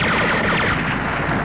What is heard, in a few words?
eexplo